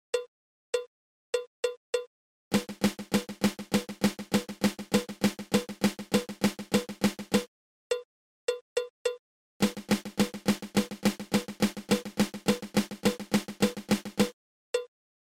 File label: Inverted Flam Tap